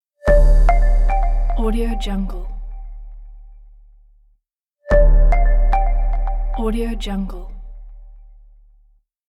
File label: the soft modern logo